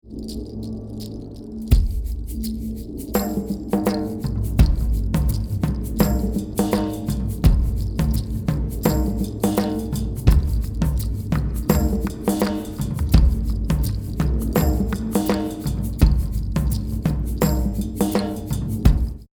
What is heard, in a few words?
drum recording, groove percussion; deichtv filmmusik; schlagzeug studio hamburg